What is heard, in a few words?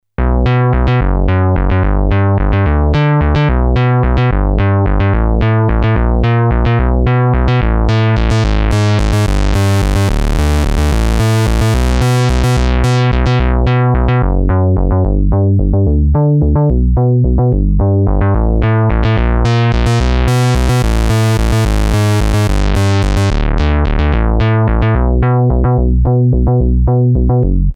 bass solid, novation bass station rack